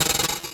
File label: Approaching the Bug Zapper; Convolution; Special Effects; IR Samples; Kontakt 5